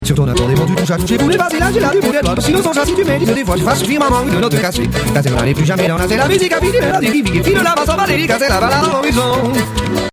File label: loop